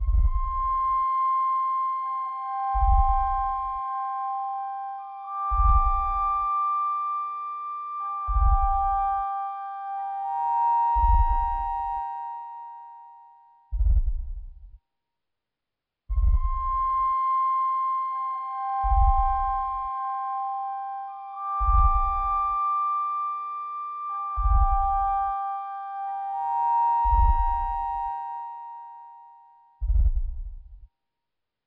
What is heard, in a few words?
Heart beat